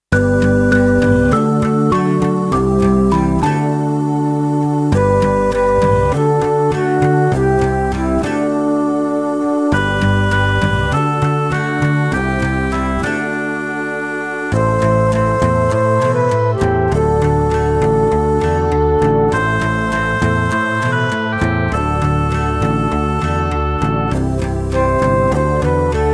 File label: Brit pop echo backing